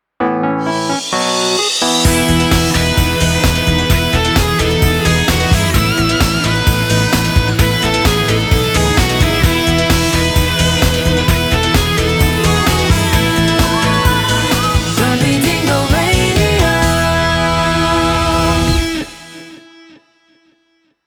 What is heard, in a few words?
Radio Jingle Slogan; Grumpy Dingo